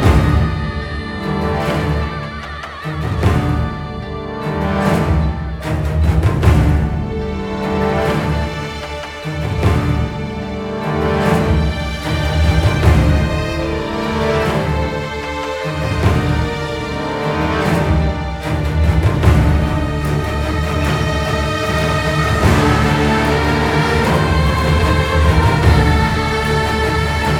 150BPM; Ch2 Boss

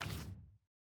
button next prev

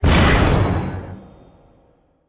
jump up; bloom minigame

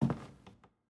Footstep Wood

